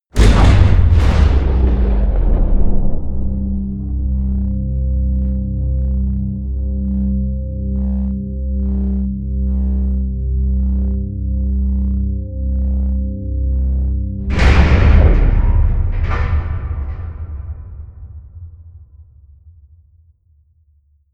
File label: promo pad; whooshes rises; hits impacts